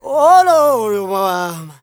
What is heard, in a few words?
Zulu male, Heart of Africa, male dry